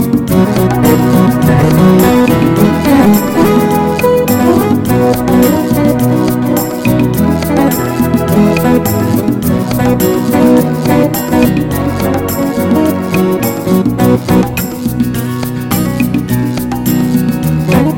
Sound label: Unplugged